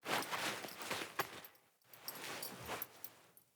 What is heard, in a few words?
Foley Action Default Song Flute Equip, Clothing; Player